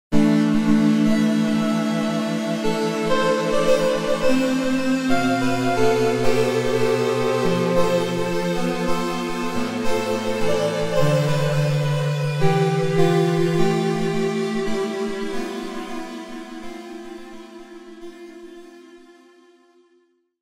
Aetheral Horns; Cosmology

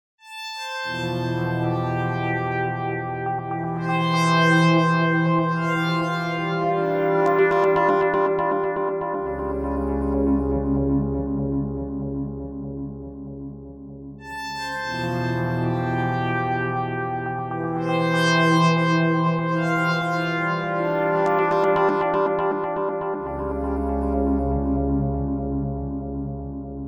horn mellow long; Nebula

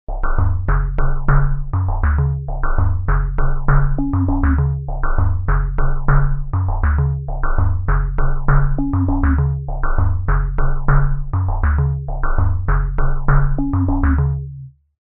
Dub Noisy hit, Chromatic hits

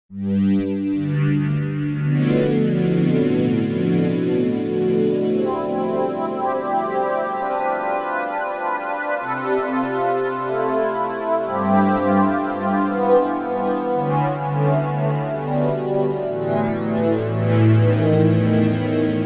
Robotic Choir; Cosmology

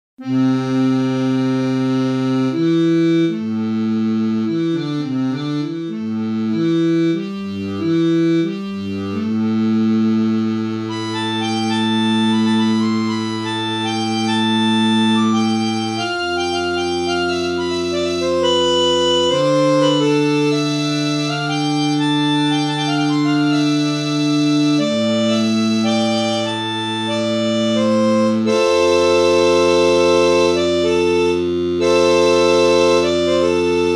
MicrOrgan open dry; Reeds

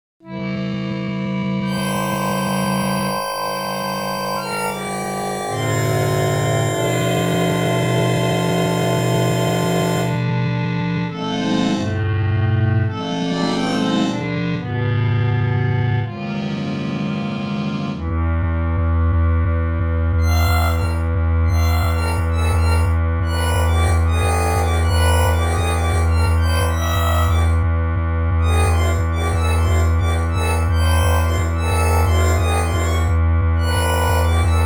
Reed Organs Industrial; Reeds

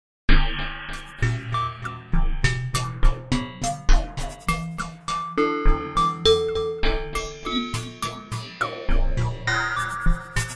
Triple random FX, Chromatic hits

